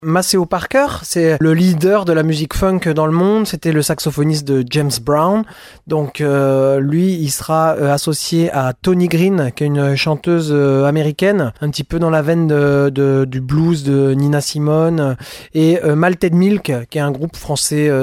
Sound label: brass festival